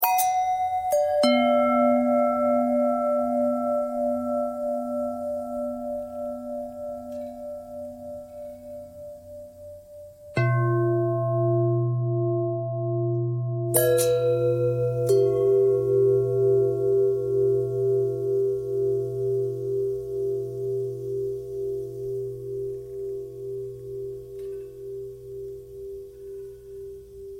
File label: bell long bell thread pentatonic